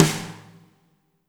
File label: WRM SNR, WARM+FAT KIT